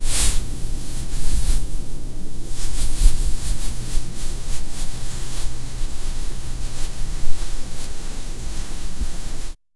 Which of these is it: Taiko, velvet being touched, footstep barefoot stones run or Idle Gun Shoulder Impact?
velvet being touched